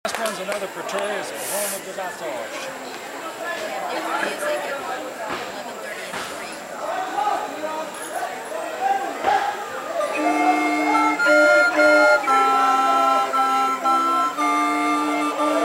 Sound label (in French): flutes a bec